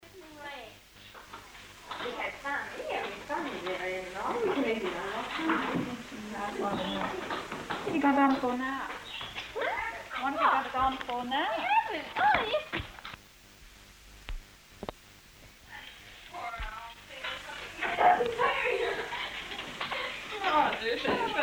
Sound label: playing piano